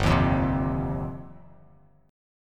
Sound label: G 7th Suspended 4th Sharp 5th, Bass Standard; G7sus4#5 strummed